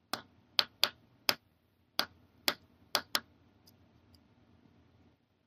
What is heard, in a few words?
CQ Morse Tap